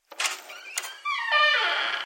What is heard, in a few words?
scream room